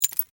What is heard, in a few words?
Multimedia Click, Scifi, Multimedia, Click, Menu, Screen, Science, Fiction; Control Panel Sound Effects; BlastWave